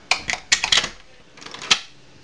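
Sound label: KEY IN DOOR; MEANCITY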